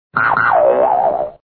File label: stream sound